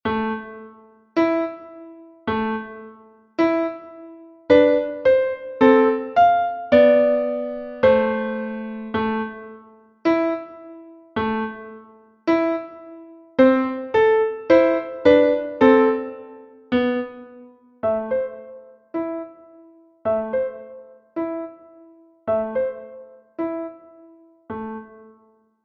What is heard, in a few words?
the cuckoo clock; humbert georges; die kuckucksuhr